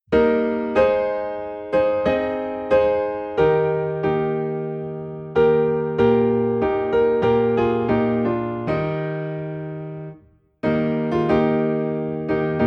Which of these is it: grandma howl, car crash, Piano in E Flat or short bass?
Piano in E Flat